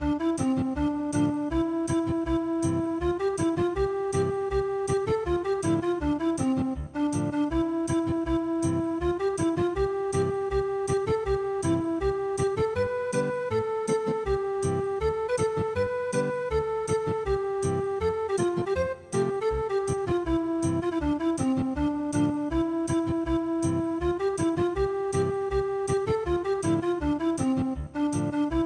Ring Song Ethnic Guitar

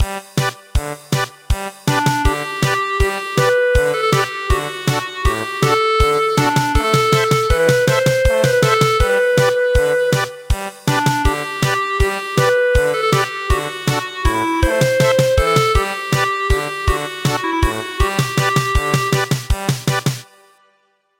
German Polka

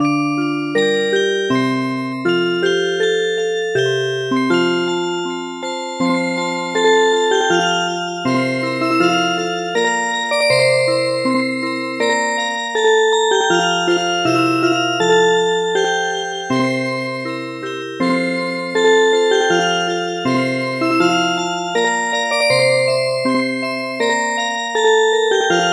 Music Box

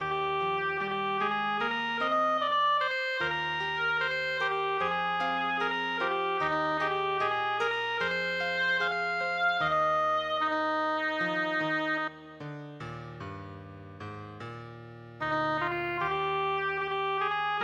Classic Piano

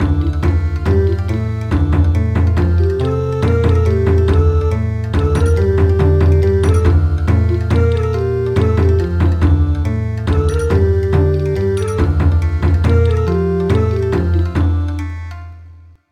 Bon Odori; A new joy has been revealed

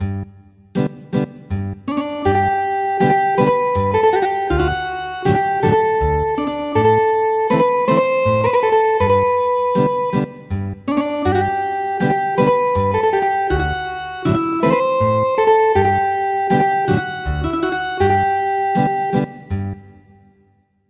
Ethnic Guitar

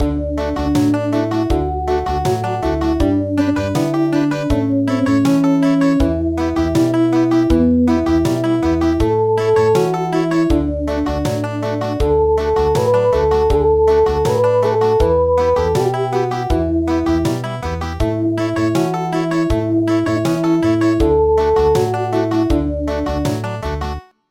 Country, midi

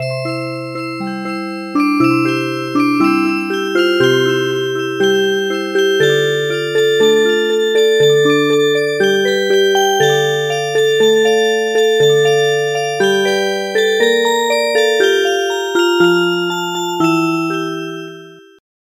Music Box

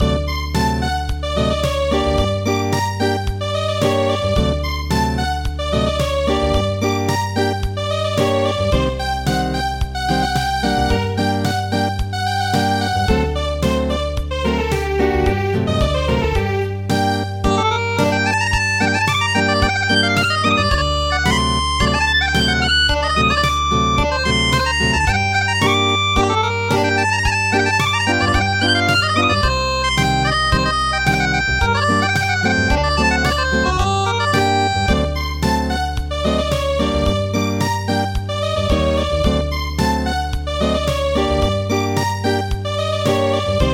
Acoustic

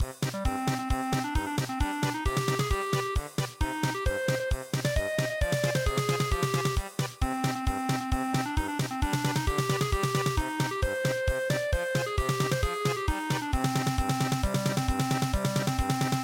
polka

